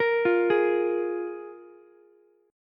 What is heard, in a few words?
F Minor Double Flat 5th, F, Ukulele; Fmbb5 arpeggiated; 15,17,16,x